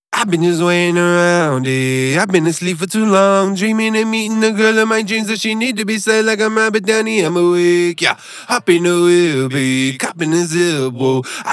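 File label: Male Female Hip Hop Pop, 122, Gm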